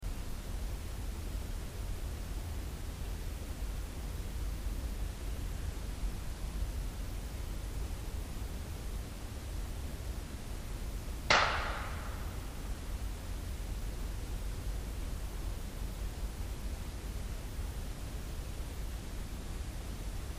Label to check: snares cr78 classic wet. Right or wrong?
Wrong